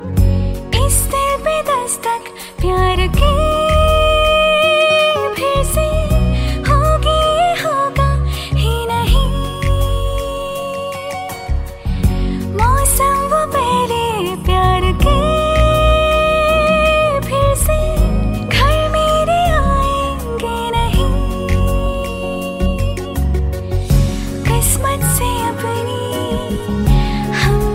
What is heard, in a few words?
Latest Hindi Song Female Ringtone; ringtones